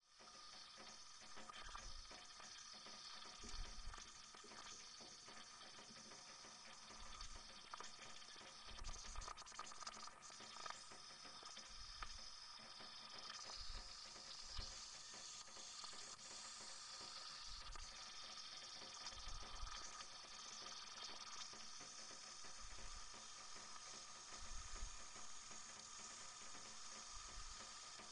Steam Engine Model - Engine - Start Run Whistle Off Short - Pitched Down 3 Oct; CO-100k; Pitched Down 3 Octaves; Pole Position